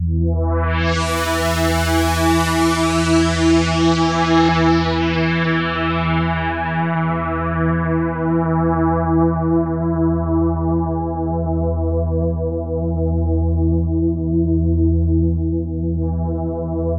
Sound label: sweep e2; mothership; Nucleus Soundlab; Viral Outbreak